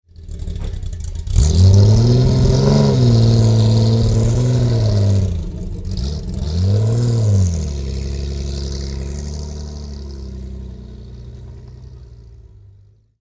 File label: Aston Martin DB3S